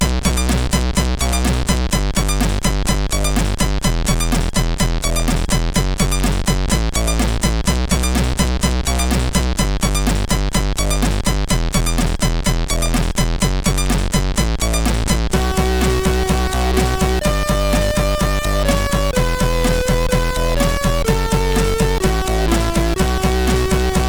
Left Arrow, CSG8580R5; sid

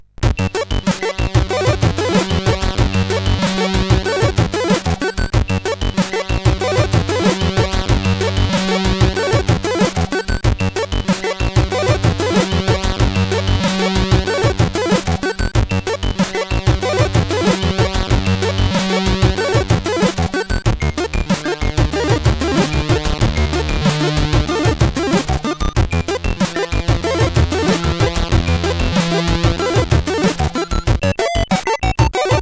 Hack, CSG8580R5; sid

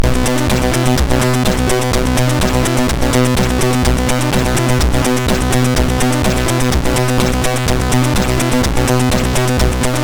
sid MOS6581R2, GAMES; Catalypse

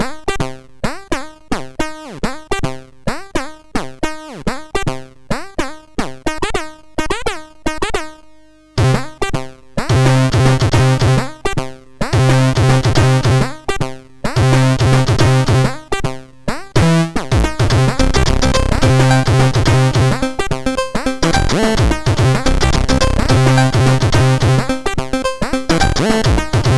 MOS 6581R2

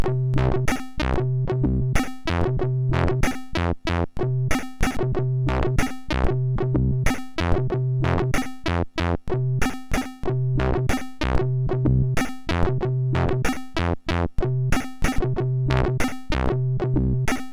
Just a Song, sid MOS6581R4

